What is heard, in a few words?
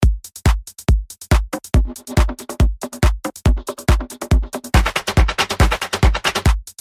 Percussion